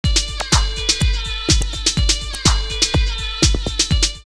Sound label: drumloops Rave 124; X-Static Goldmine; 90 s Sample CDs